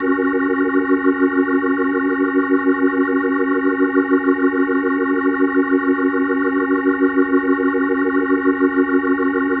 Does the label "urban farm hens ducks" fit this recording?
No